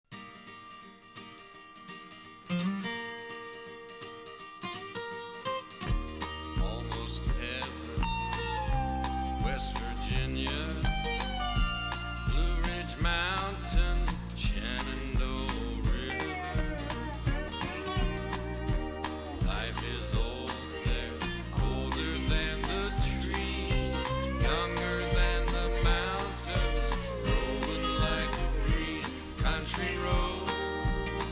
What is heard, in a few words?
harmonica